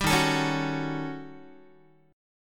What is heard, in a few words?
Eb 7th Sharp 9th Flat 5th, Eb, Guitar; Eb7#9b5 strummed; 10,11,12,11,10,x; JGuitar